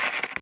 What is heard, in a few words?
click write